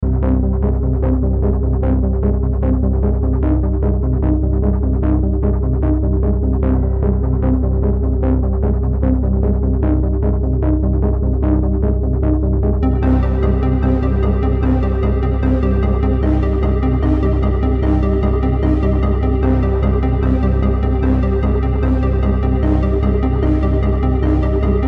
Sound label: Sci-Fi Fantasy Cosmic Odyssey